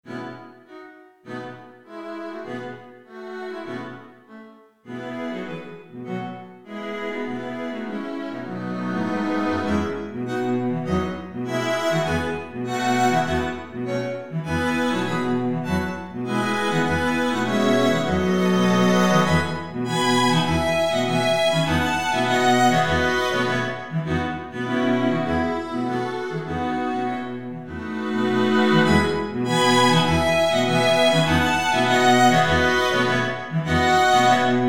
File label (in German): streichquintett